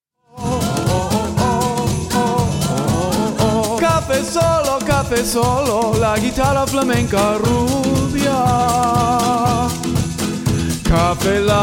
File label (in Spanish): la guitarra flamenca negra